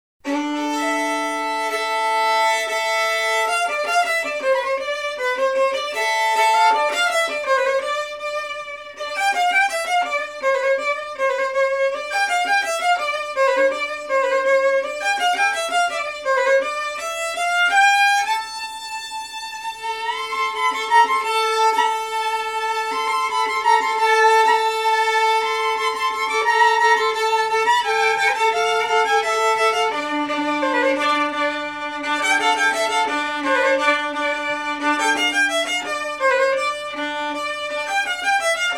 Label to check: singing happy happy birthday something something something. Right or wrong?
Wrong